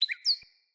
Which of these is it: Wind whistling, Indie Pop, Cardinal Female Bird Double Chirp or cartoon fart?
Cardinal Female Bird Double Chirp